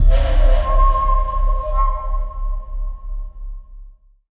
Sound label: Puzzle Success